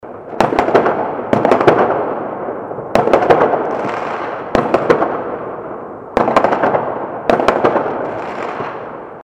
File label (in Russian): zvuk fejerverka